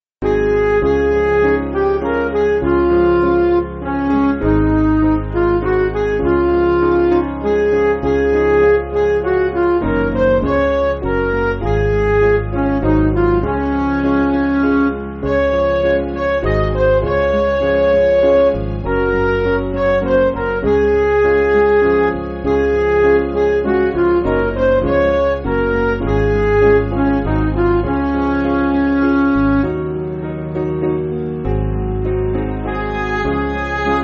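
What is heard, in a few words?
Music Backing Files for Churches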